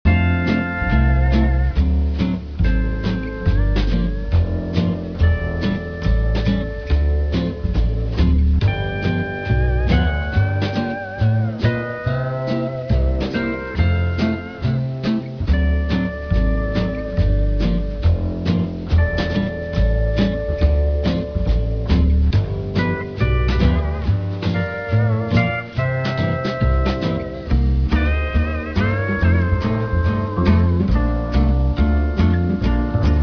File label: On Hold Jazz